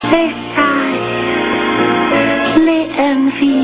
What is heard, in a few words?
le sigh